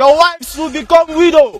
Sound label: charge, Voices Emotes